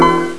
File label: ding; baseq2